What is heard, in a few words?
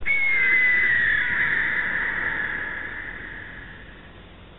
eagle; animals